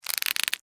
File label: Insect Voice Wet, Clicks, Vocalization, Bug; Animal; BlastWave